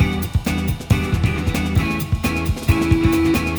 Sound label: full, Loops Phonky